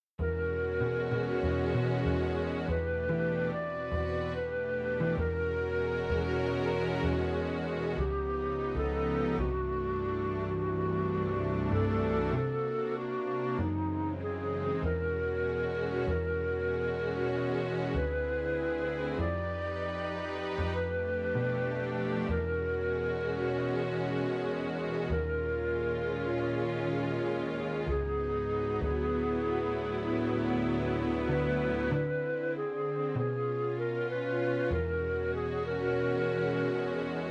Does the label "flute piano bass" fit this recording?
Yes